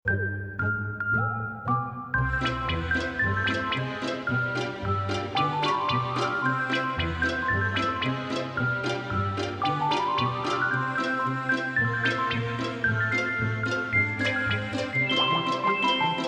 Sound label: cartoon elastic walk